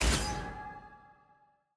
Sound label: click; arcane